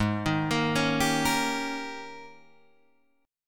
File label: Ab Major 7th Suspended 2nd Suspended 4th Ab, down, Guitar, Drop D